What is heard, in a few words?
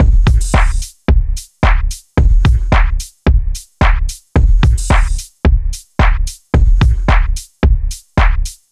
groove boy 110bpm